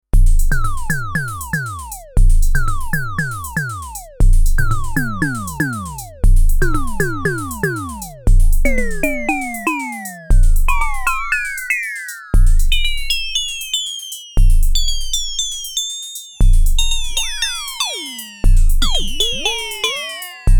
ADX-1 Synth; Mode Machines

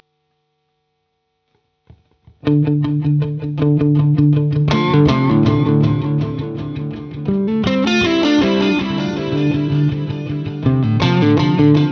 Basic Chicago Delay; Traktor

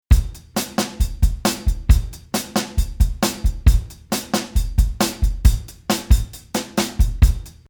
Motown Drummer original

